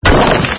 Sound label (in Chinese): 主角碰撞石头音效; xingqiu